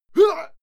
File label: male fight attack hit; soundpool